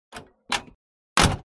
Car Door OC Light

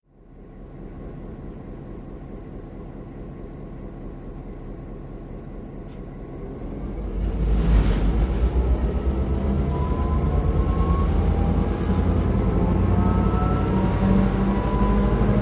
Train Rev Up Down, diesel

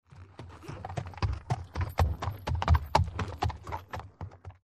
By Single Horse Walk U

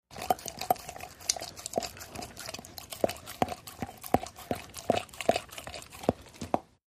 Dog Licking Mug; mvmt

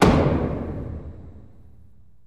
Gavel Impact On Wood; gavels

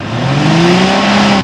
Cadillac Hi Rev

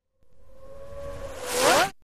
Synth Laser Zap By Pan, zaps

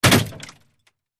Bullet Impacts Metal

